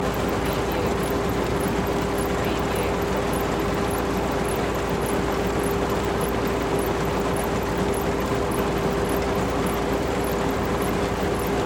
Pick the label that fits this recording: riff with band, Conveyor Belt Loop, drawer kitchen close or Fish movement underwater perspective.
Conveyor Belt Loop